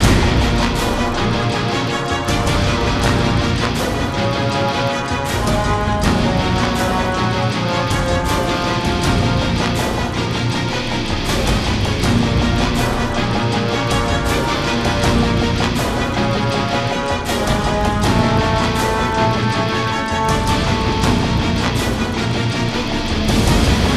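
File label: music, heavy loop; transformers war for cybertron gamerip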